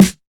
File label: Tight Low End Snare Drum Sample F# Key, acoustic snare